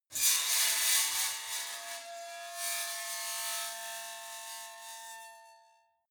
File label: metal squeal bright